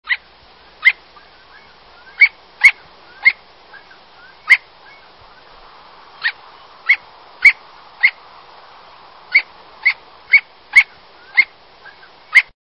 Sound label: Long tailed Jaeger